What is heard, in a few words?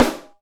Snare, Alesis DM5 Drum Machines